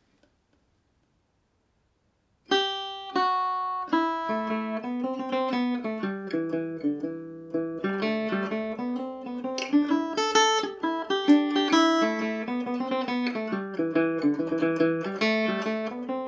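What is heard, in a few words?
A dorian, Irish mandolin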